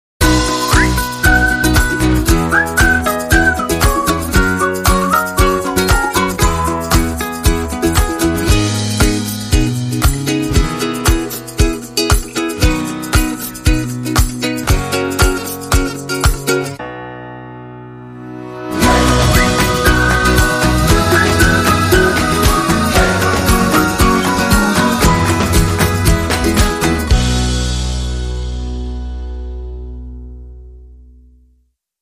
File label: Fabulous Ukulele